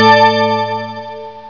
NICE DING

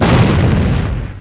EXPLOSIN

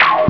INVERT; SHOOT'EM UP

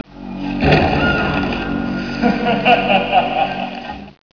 LAUGH

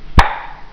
FINGERS, CLICKS

